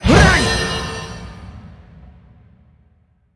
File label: Summoner M Skill